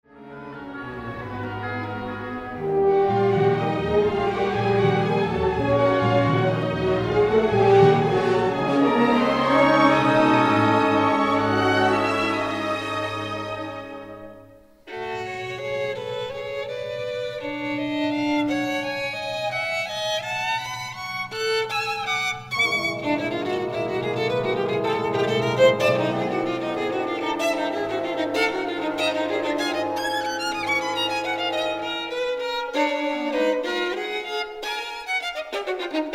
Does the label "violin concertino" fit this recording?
Yes